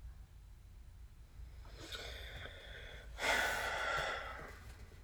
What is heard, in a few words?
sigh